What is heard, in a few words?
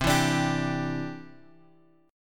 C Major Add 9th, Guitar Open G